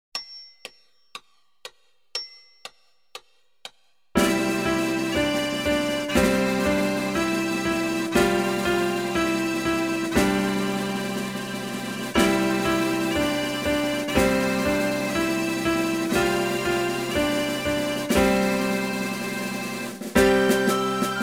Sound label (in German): der dudelsack